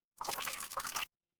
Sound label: water stream